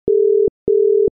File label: ringback